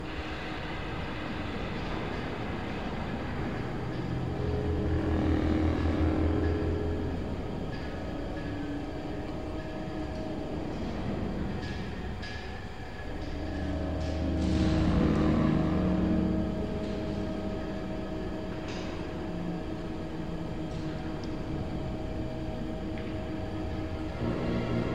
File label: Background Theme; Angry Birds Star Wars; android game music